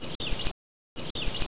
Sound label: spotted sandpiper